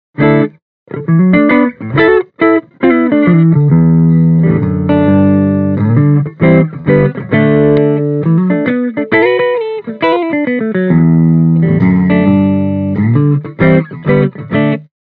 Mic Inside Clean Les Paul; Mini Rox; THE GOVERNOR

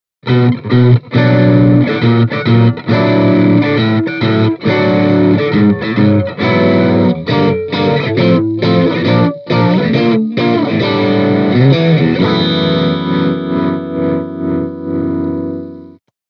Mic Inside G12H 65 Overdrive Stratocaster; Mini Rox

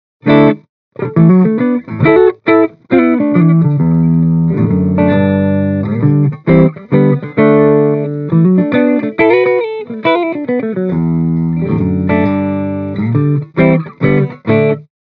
Mini Rox Mic Inside PRIVATE JACK Clean Les Paul

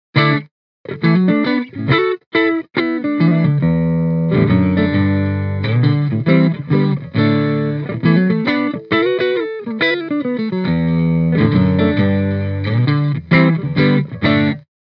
Mini Rox Mic Inside G12H 75 Clean

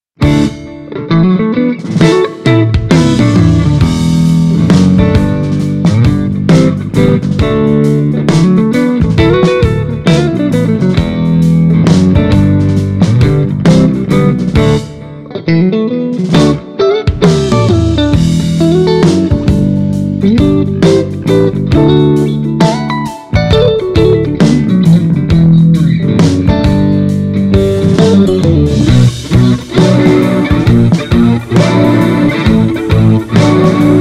Stratocaster; Mini Rox Mic Inside

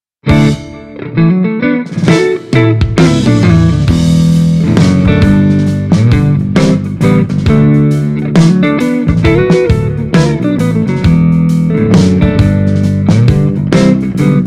Mic Inside Tema Stratocaster; Mini Rox; Nordik Gjallarhorn

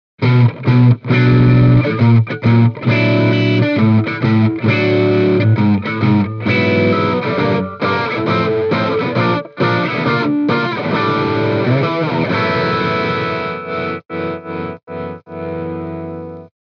Celestion Greenback G12M Crunch Les Paul

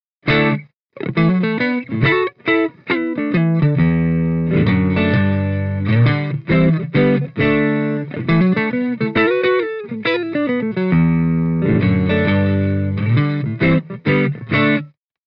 Eminence Legend GB128 Clean Stratocaster

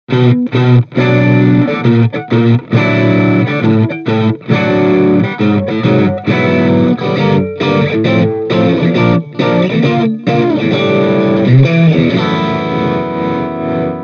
Mic Inside Overdrive Stratocaster; Mini Rox; Seventy 80

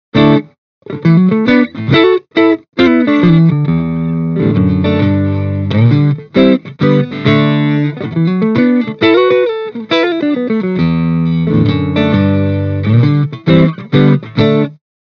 Clean, Stratocaster; Mini Rox Mic Inside

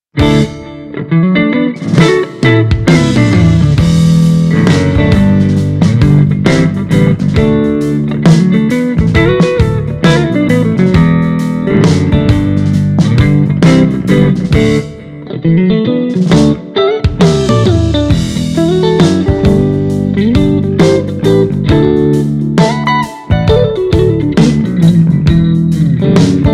Stratocaster; Mini Rox Mic Inside; THE GOVERNOR

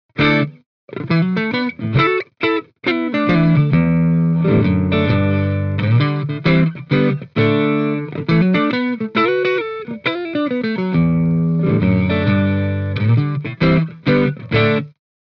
Celestion Greenback G12M Clean Stratocaster

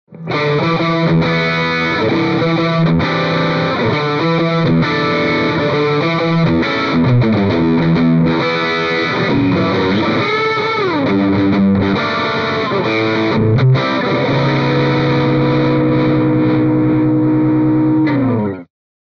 Celestion Vintage 30 Distortion Stratocaster

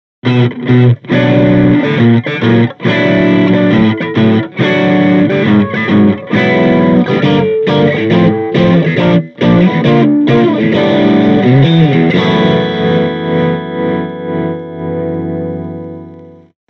Mic Inside Jensen C12N Overdrive Stratocaster; Mini Rox

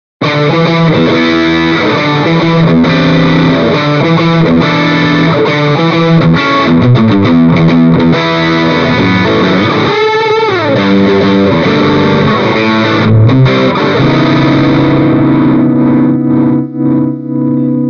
Distortion, Stratocaster; Mini Rox Mic Inside

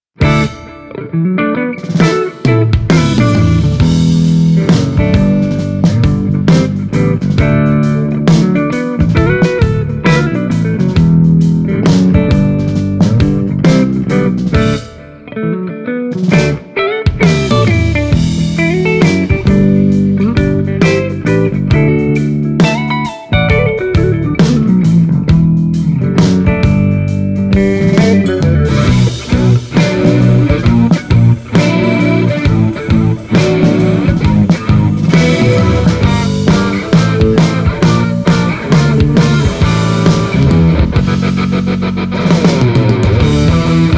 Celestion Greenback G12M Les Paul

